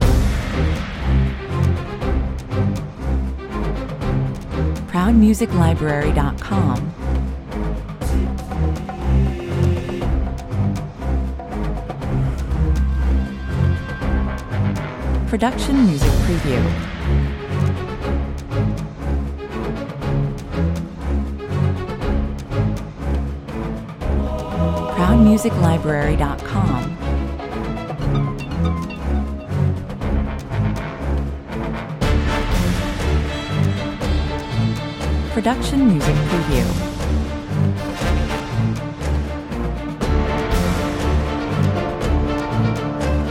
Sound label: approaching threat 120 bpm